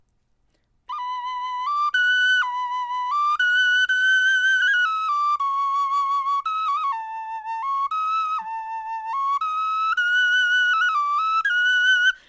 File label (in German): melodie, knochenfloeten